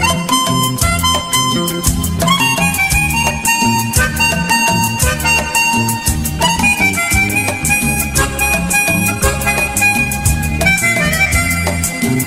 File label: Flute Tone